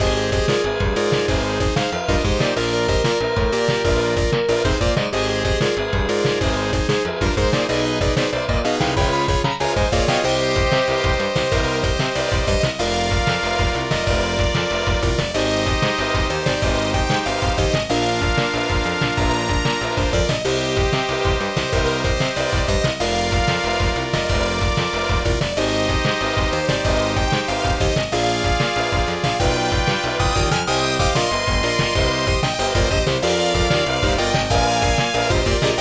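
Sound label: background music; Varth - Operation Thunderstorm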